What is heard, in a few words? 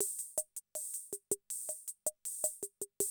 CR78, DrumLoops